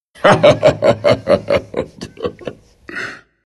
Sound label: dragon knight laugh